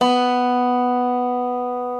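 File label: reference pitch dobro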